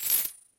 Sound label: coins